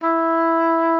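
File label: E4, oboe d'amore; Xsample VOL-7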